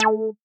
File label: synth